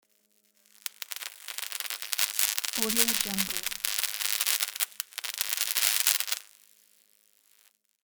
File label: electric sparks